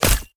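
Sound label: luna arrow, impact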